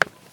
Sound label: stairs step; Golden Crayon Sounds